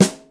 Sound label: sn nm; 300 Drum Machines; Yamaha Motif ES-Series